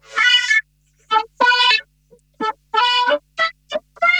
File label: Funk Guitar; 90 s Sample CDs; Zero G